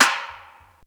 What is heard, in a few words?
CLAP ROOM; drum; CLAPS+SNIPS